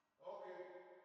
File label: Drums Verb